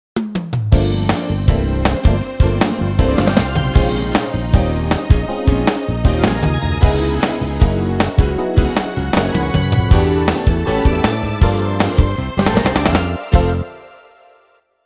Make Your Own Melody

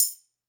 Tambourine Single Hit

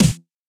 Heavy Snare

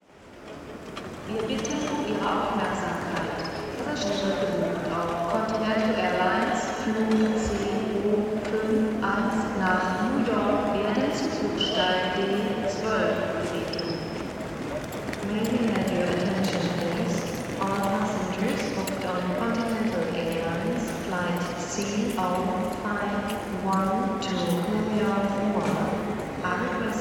Frankfurt Airport Germany

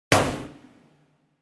Hard Metal Impact

